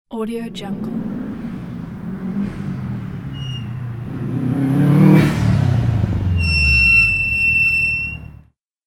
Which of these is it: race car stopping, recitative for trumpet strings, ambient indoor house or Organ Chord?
race car stopping